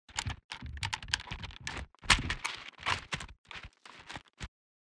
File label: movement walk; Ranger